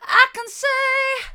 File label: i can see mid, vocal